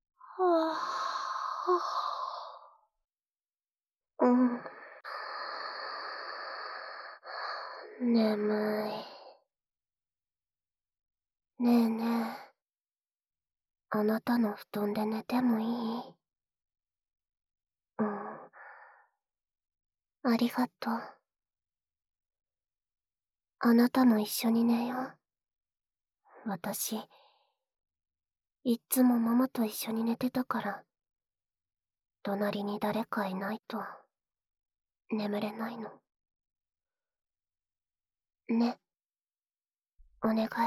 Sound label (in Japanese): 汗だくの肉布団に抱きつかれて耳舐め尻尾コキ 効果音カット